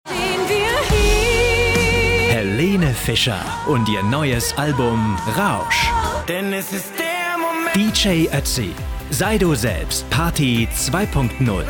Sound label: Trailer Universal feierlich